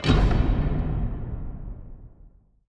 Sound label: Reel Stop All; godsoffortune